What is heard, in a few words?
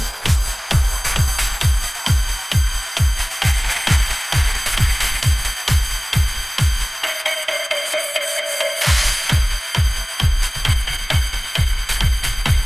ringtone hiphop